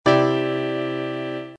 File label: Cmaj add9